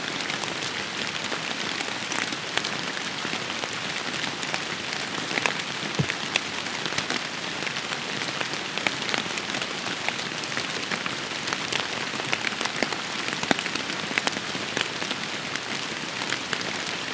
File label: rainforest; Amazon Rain Forest